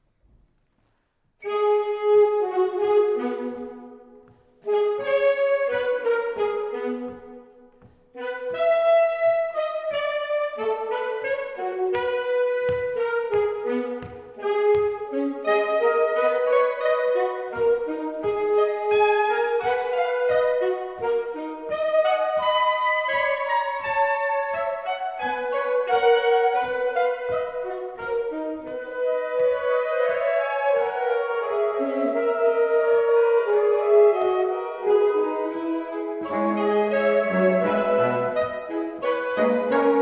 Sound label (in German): Fuge gis moll; Fuge Nr 12; Schostakowitsch